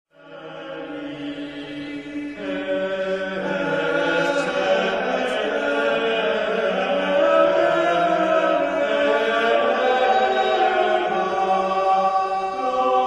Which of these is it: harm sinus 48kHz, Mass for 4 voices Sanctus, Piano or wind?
Mass for 4 voices Sanctus